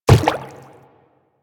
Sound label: Quadruped Medium Walk Water, Footsteps